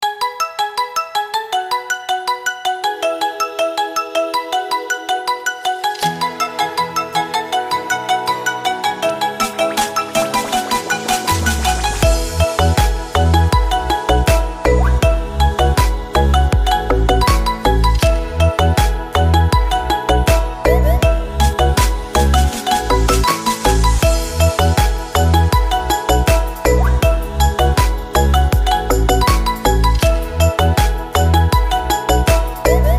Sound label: xiaomi remix ringtone; Xiaomi U.S Remix